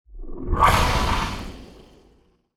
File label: fighting game designed defense low rumble shield increase